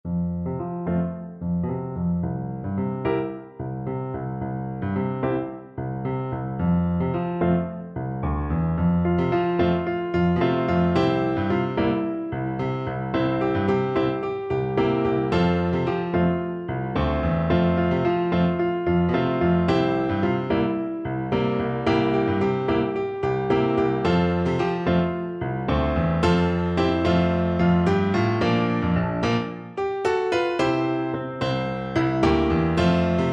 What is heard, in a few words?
ning wendete piano